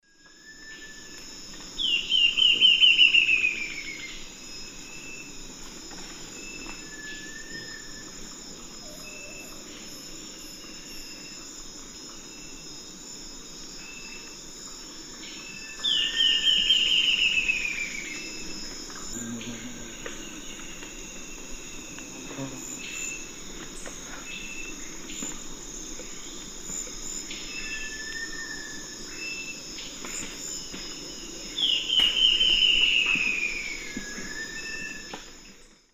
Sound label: Lepidocolaptes albolineatus, song; S. America; Eastern Lowlands